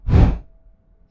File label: candle light